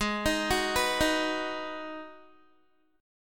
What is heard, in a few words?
G sharp Minor 7th, Guitar; arpeggiated; 15,18,18,16,15,x; 4F,4A,5C,5G,5C,6E; G#m7